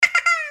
wahaha; slime rancher

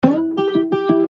chords

